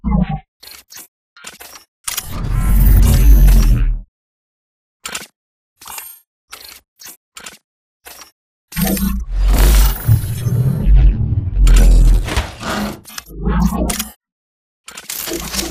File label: Casual Idle; Titanfall 2